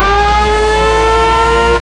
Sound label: race begin tone